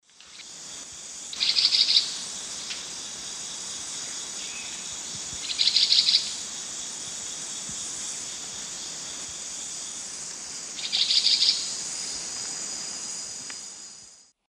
Euphonia rufiventris, calls; S. America; Eastern Lowlands

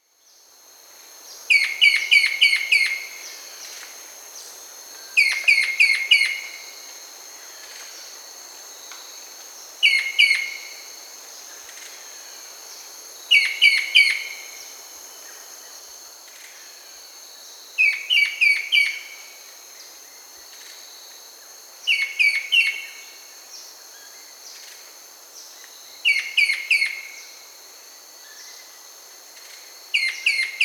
Striped Woodhaunter (Hyloctistes subulatus), Peru; S. America

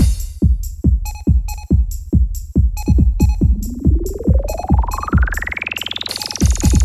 techno 140

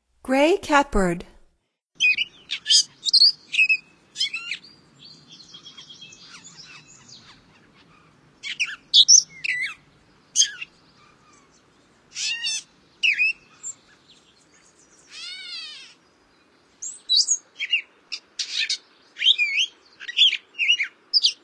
Gray Catbird, Songs of Birds of North America; Stokes

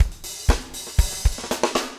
4 on floor 120, loops, 120bpm

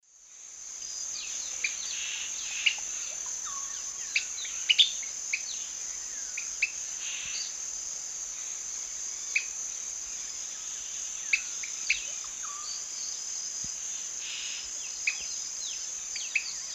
Knipolegus orenocensis, song; S. America; Eastern Lowlands

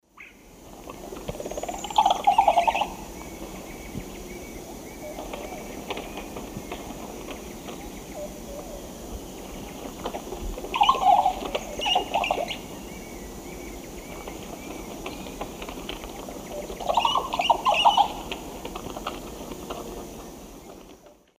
Psarocolius viridis, display song and calls; S. America; Eastern Lowlands

